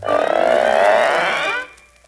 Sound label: creak expand